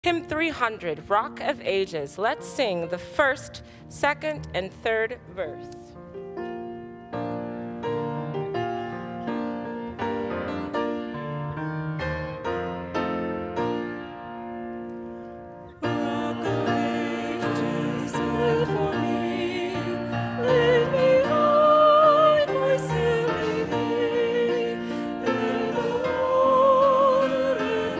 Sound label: Rock of Ages, Choir Hymns; ALL 3 Stanzas; SDA Hymns; Sabbath School